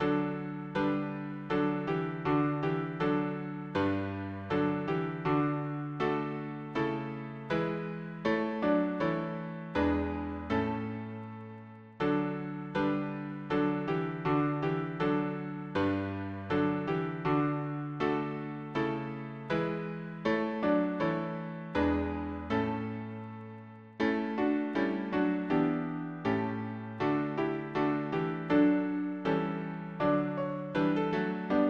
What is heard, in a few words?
harmony piano